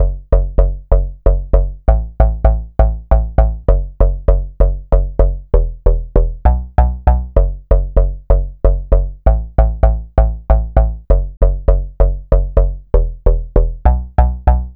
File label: Synth loop 130 F#m, Synth Bass Loops; Slate Digital BASSHOLE Sample Pack